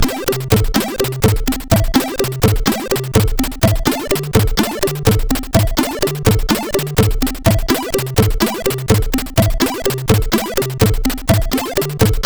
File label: sid CSG8580R5